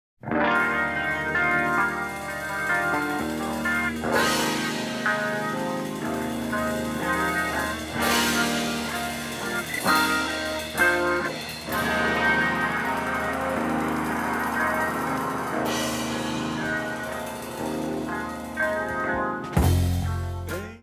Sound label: leslie